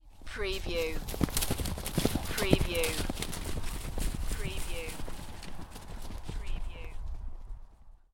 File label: 4 horses run past, trampling and leaves rustling